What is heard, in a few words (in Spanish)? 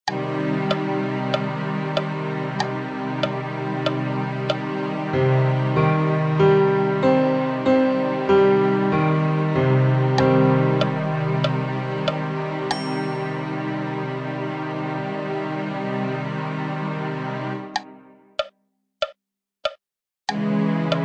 triada menor, ear training